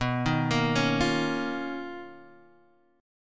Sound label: B Major Sharp 11th, Guitar, Half Step Down; BM#11